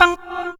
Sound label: percussion, Severe Gate Kit; Dance Synths